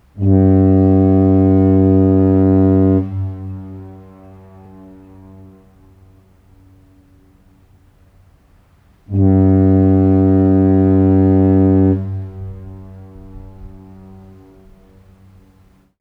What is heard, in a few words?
Foghorn